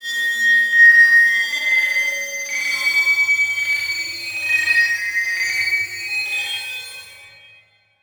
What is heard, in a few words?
Transition Stab Dark Bell; Production Element